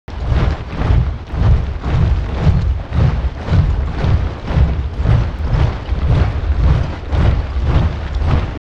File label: big army crowd marching